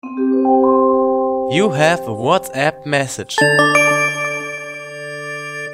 WhatsApp Besked Tone ringetone

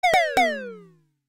samsung s21 disco toms meddelelse, ringetone

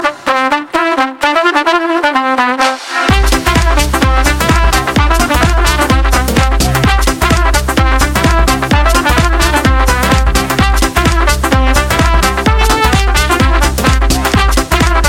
julefolk

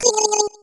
ringeklokke

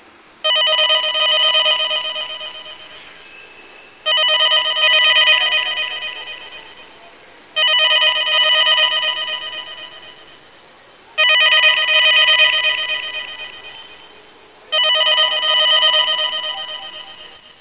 Langt væk Echo Phone ringetone

